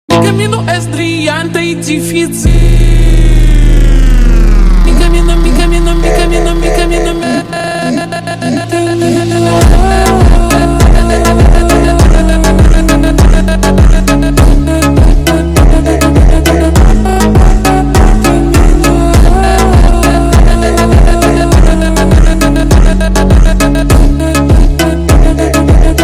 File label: funk super slowed